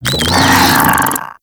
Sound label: Desert Skate Vocal Attack; Warframe